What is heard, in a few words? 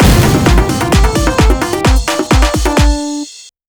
goal fanfare; Marble It Up Ultra